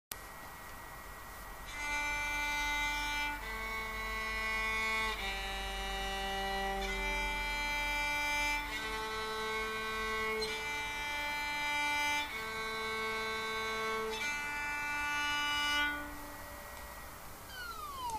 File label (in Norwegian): understemme; Come follow